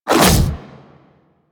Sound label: Infested Splitter melee swipe small; Warframe; Grey Strain